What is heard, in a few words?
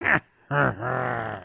Walk, creatures organic